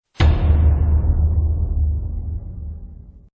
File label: scary